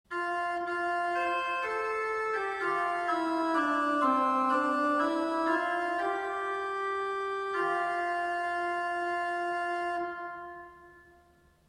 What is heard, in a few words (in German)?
Cornett 5fach